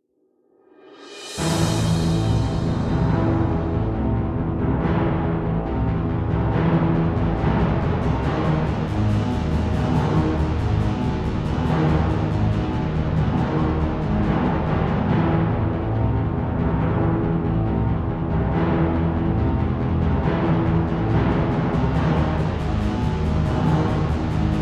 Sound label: Music Template - Tension; Mass Effect 2